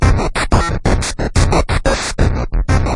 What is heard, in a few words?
rock